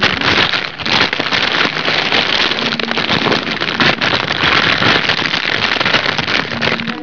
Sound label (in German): KNISTERN